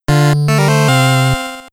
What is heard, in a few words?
Item Get; Voidspire Tactics